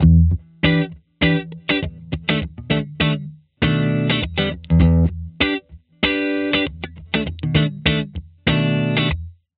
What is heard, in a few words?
bling darkjazz